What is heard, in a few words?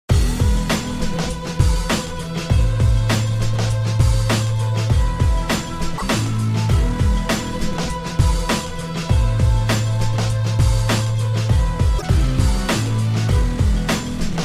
Menu Loop; Castle Crashers